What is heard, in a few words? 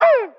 "ooh" fake guy; ooh ahh